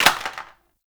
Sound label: Plastic Drop